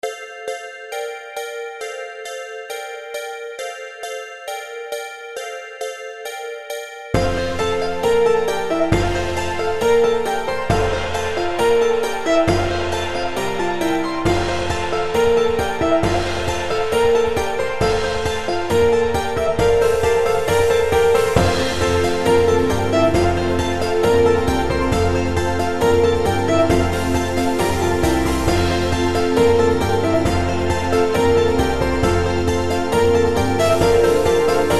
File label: Christmas Backing Track